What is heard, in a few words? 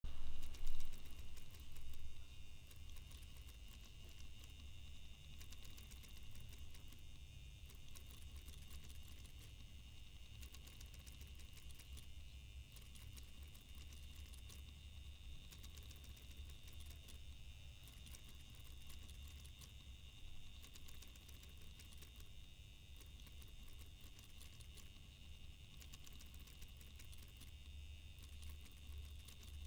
birdsong